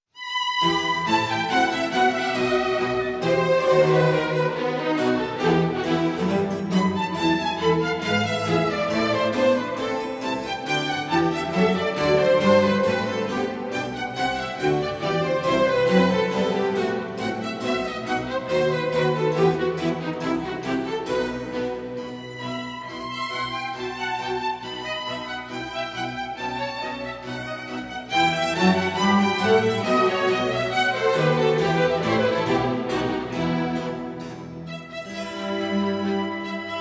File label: flute conc